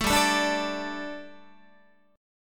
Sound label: G Suspended 2nd G, down, Guitar; Gsus2 strummed; JGuitar